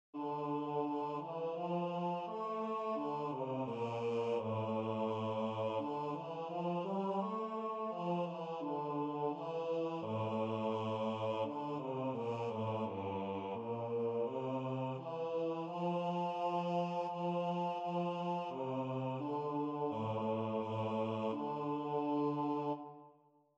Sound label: Bass